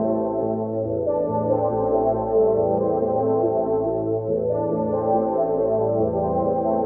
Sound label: SPACE PAD 140 A; SpaceSounds